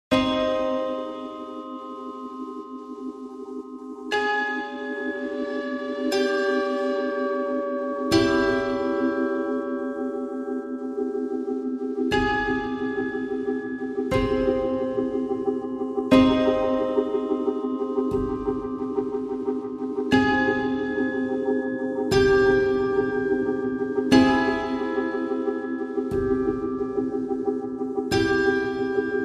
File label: No Drums No Bass